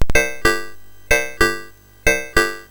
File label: FX, MOS6581R3; HVSC; Software House